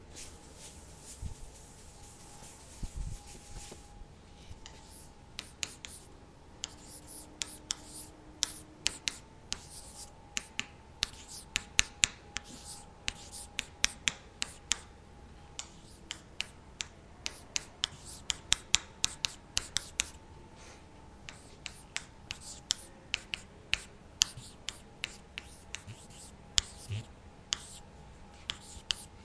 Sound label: chalkboard